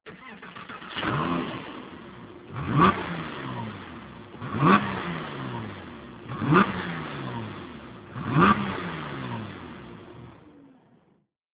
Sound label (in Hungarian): motorindítás, porsche 911